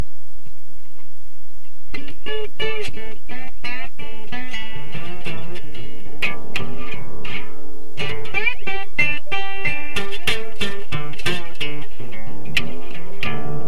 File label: slide guitar blues